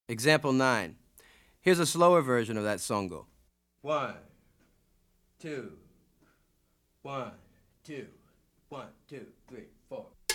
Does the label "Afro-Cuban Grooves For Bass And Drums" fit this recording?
Yes